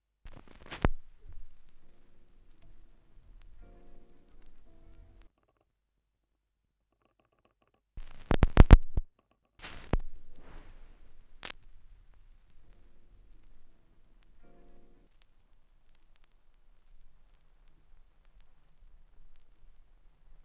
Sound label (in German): schallplattengeraeusche